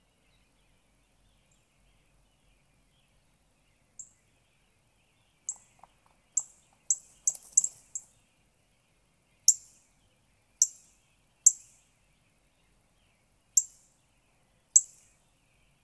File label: Northern Cardinal